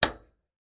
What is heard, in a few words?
household heater portable switch on or off